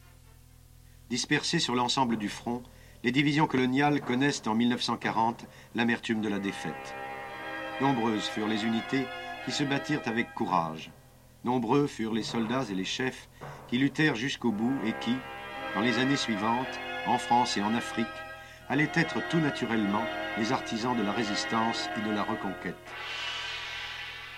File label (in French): Marche; Troupes de marine